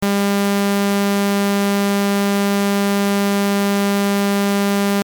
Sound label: 200 saw